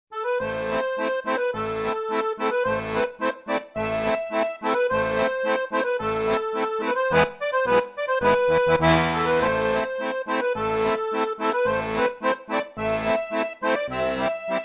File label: diabelli turkish march; classic